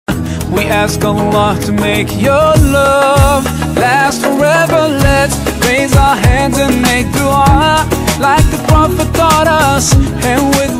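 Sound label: english islamic ringtone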